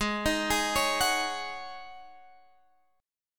G sharp 7th Suspended 4th, Guitar, Half Step Down

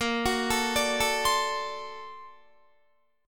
Bb Augmented Major 9th Bb down Guitar; 4E,4A,5D,5G,5C,6F; Bb+M9 arpeggiated; JGuitar

